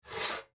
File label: MedicalScientific; Soundfx III